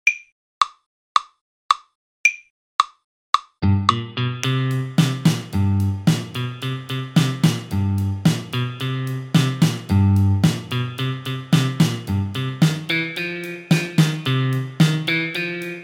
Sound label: Groove Rock n Roll; Groove 4